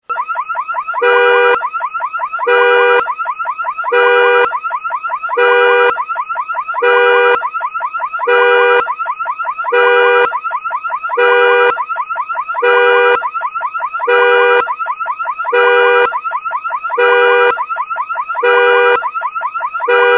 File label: car alarm